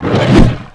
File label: werewolf attack